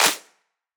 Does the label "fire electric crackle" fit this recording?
No